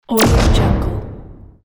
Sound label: laser impact